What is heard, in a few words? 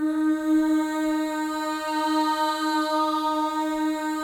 MOVING V3, Symphony of Voices; Spectrasonics